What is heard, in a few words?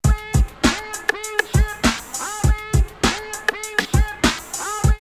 Manic Voice 100, drumloops OldSchool; X-Static Goldmine